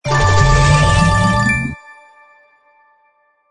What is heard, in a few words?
arrow up